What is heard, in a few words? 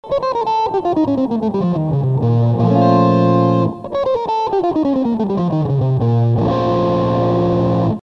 Line Chord, guitar sounds